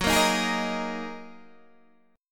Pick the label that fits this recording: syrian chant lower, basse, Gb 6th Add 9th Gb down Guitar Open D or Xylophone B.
Gb 6th Add 9th Gb down Guitar Open D